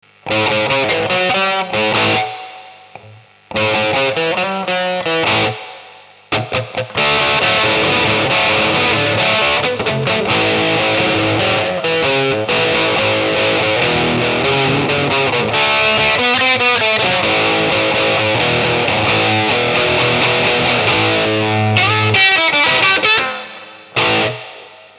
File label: guitar rnr